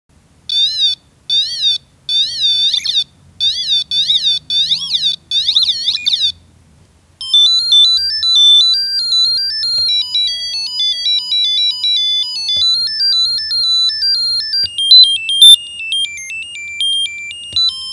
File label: theremin